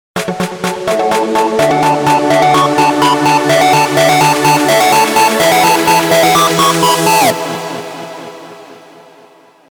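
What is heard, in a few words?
Melody and Chord On CutOff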